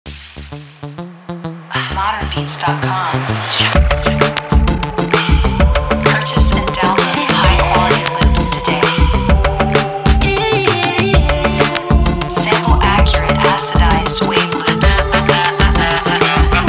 Pop Anthemz

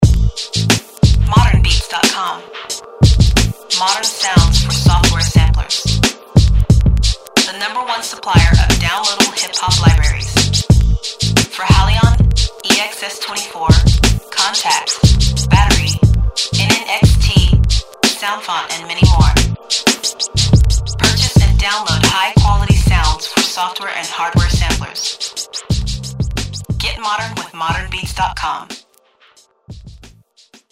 Vinyl, drums samples

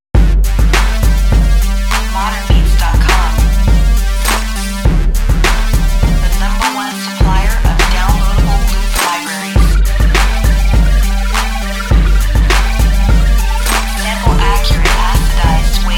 Xtreme Synth Loops, synth loops

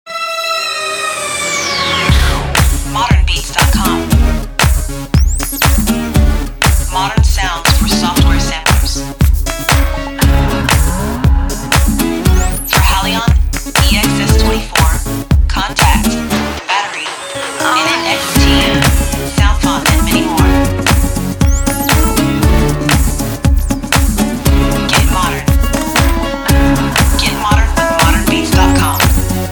Urban Guitar Chopz

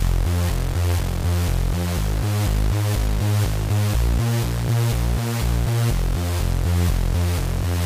saw synthesizers; edgy synthesizer timbre; combination of Thor Synthesizers

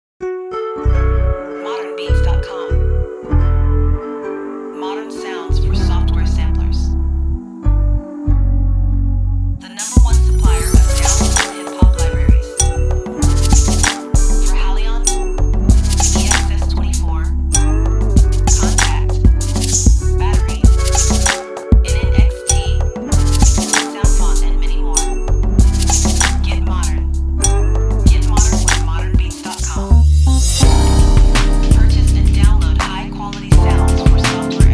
Tru Bass, bass guitar samples

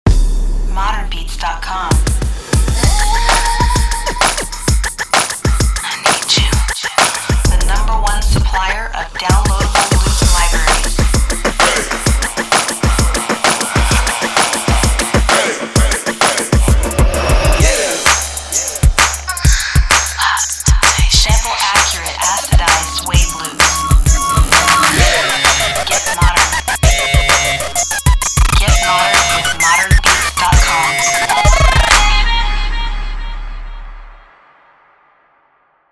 Pop Rhythmz

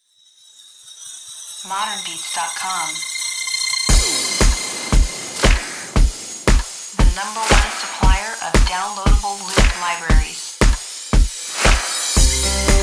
RnB Klub Drum Loops

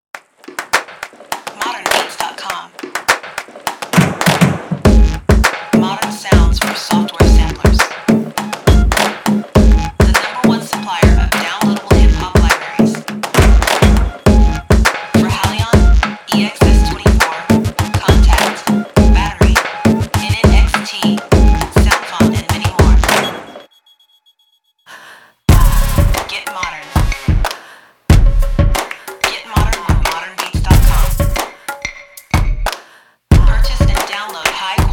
klub klapz

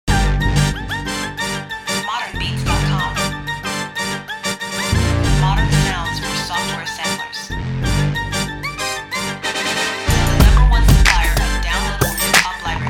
Brass Elementz

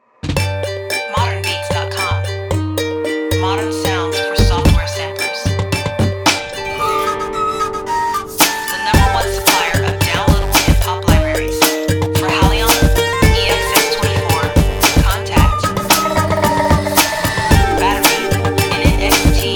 Ethnic Music Chopz

